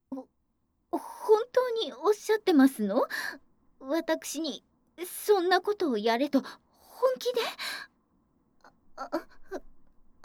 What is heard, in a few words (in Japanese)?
メイドさんごっこご奉仕マッサージ